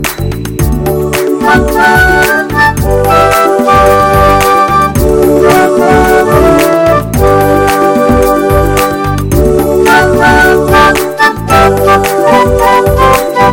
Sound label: Smooth Sounds